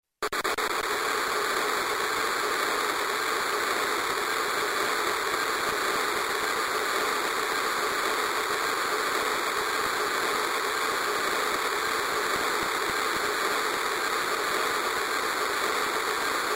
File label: Television